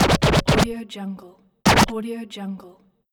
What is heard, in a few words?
dj scratch; yellowcatt